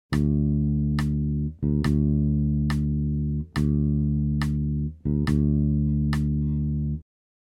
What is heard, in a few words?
Pattern, Bass; Bass Unlimited